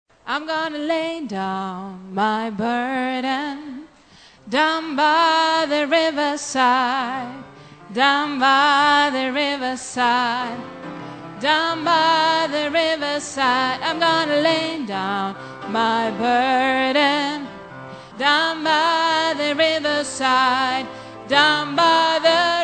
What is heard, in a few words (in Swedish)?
sopran